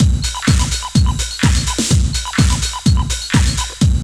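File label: drum loop; X-Static Goldmine 3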